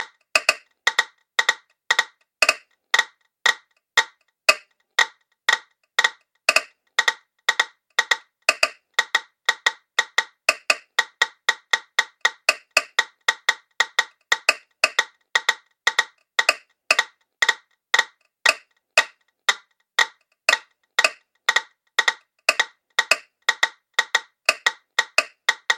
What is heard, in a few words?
116 tempo